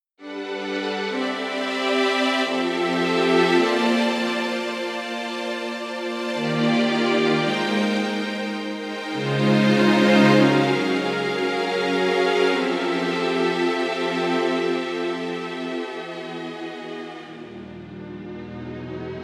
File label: Slow Strings; VIVO S10